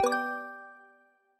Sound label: correct